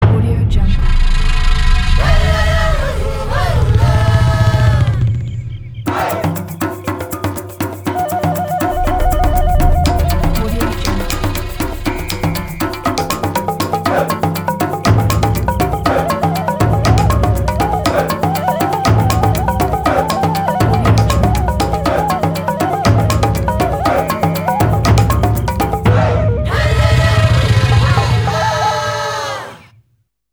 tribal dance jingle; timdonovan

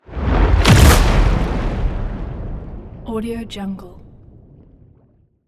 water liquid explosion; soundmorph

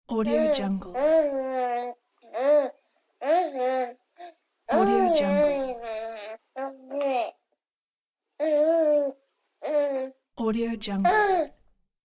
baby sound; djsapa

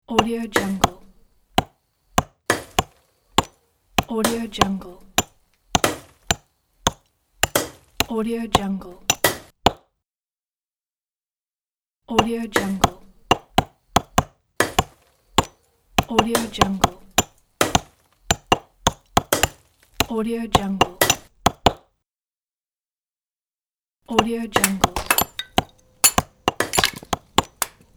building demolition

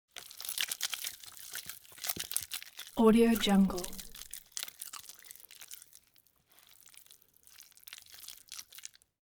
squishy flesh; collanderson

